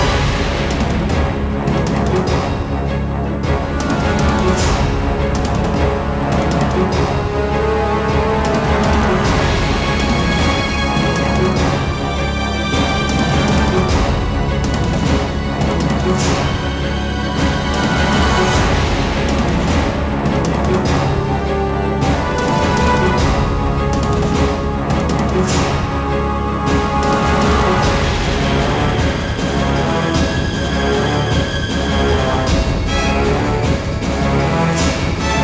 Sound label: Ambiance Combat; The Amazing Spider-Man; Nintendo DS